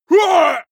male shout hit heavy

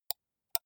computer mouse left click single slow

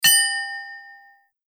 bicycle bell

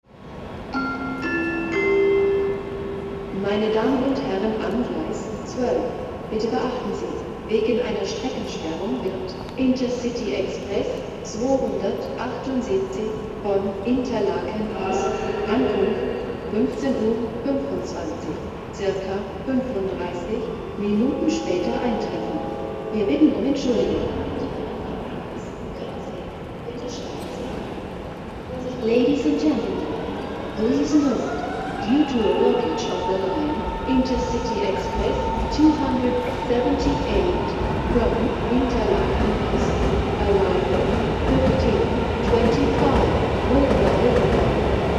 railway station german

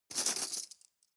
cutlery teaspoons drawer grab

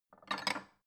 bowl take hard

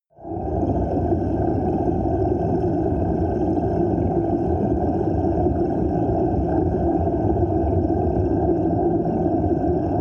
water fx dark

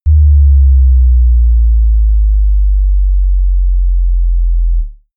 bass drop soft

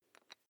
electric drill bit fasten

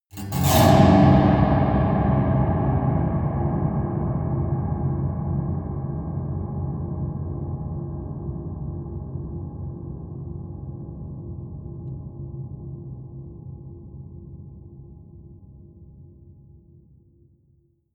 piano twang multiple fast low

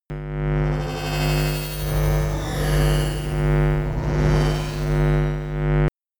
promo pad

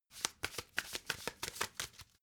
playing cards shuffle regular

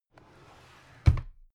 drawer cabinet carton close regular

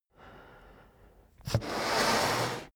balloon blow breath in

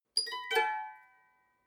guitar clink stroke down

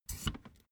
office chair hydraulics down stepwise

